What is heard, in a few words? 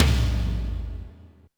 Yamaha RM 50 Toms